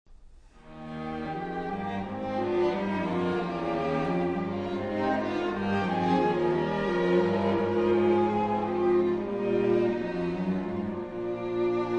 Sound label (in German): Streichquartett c-moll - Einleitung zum 1. Satz; Hans Rott; Mainzer Streichquartett